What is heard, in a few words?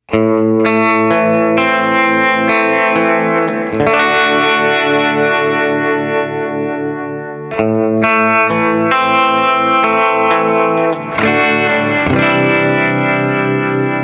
Guit Twang; Avengers Social Club; Korea